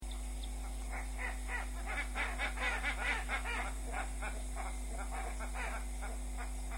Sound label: boat billed heron